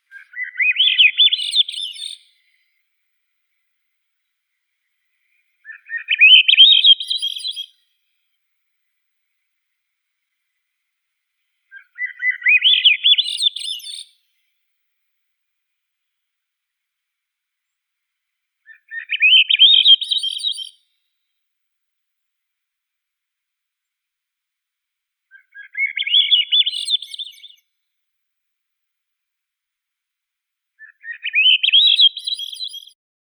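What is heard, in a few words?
swainson's thrush, catharus ustulatus; drozd svensona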